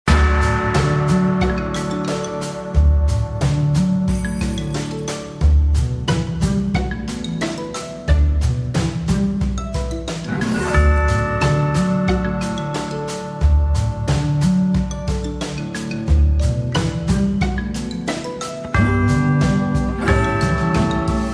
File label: game sound ringtone; CANDY CRUSH